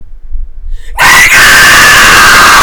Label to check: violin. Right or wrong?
Wrong